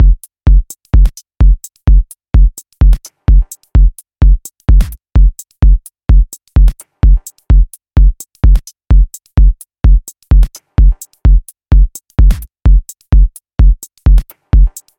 rhythm electro drum machine house minimal - fm - 128; drum loops